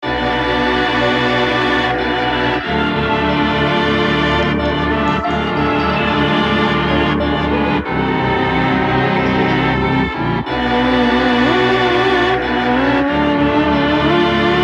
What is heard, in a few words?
BGM CAVE